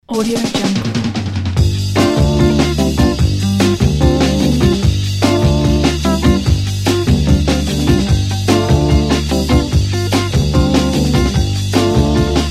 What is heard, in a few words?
vintage jazzy soul food